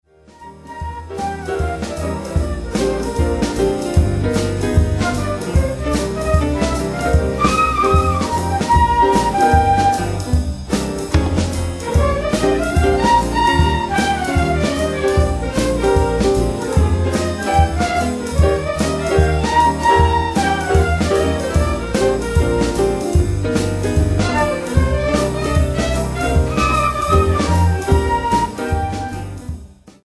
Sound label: flute blue